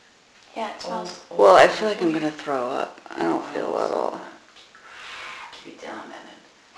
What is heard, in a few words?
throw up and wtf noise; waffle house